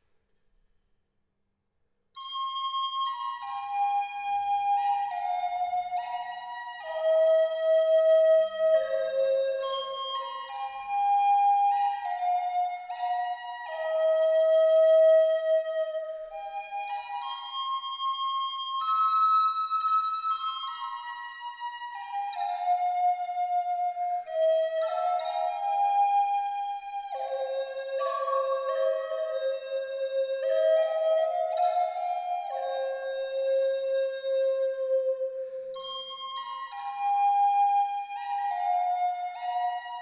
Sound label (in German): Haselbaum Cis hoch 432Hz